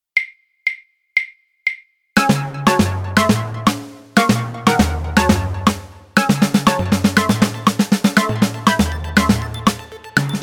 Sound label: snare drum synth click 120 bpm